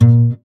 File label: GUI AC, Acoustic Slap, Roland